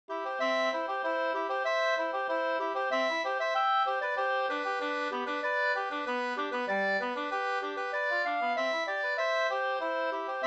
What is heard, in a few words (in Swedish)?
snoa Jamtsnoa